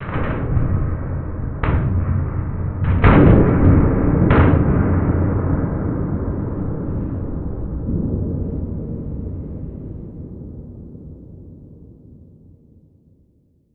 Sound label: big hit far